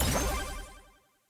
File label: button upgrade click success; lobby strawberry